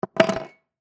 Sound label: Skeleton Key Down, Set; Foley; Jangling (Sound Effects:Objects); BlastWave